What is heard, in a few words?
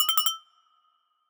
Mouse, notifications; Lenovo; ZUI 2